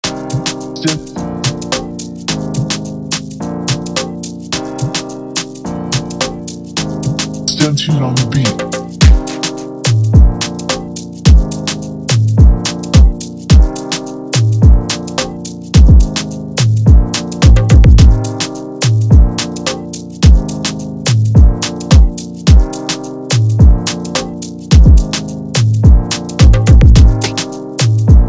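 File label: Afrobeat instrumental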